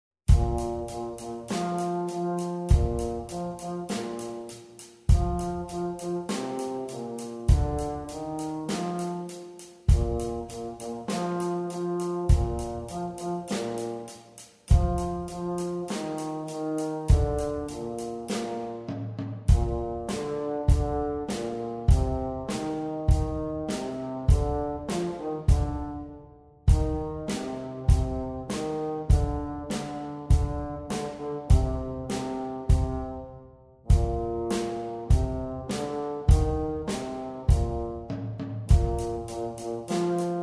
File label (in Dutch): Rock Bariton